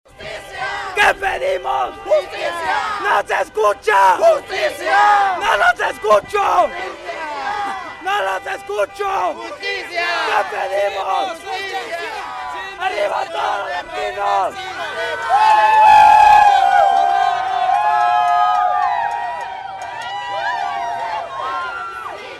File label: justicia chant mayday